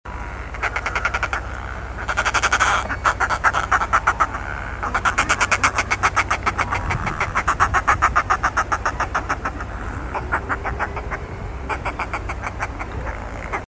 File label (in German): Eissturmvogel; Ruf